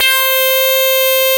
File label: MS20, LEADS; Masterbits; Vintage Voltage